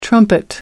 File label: trumpet